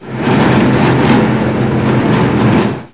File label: TRUCK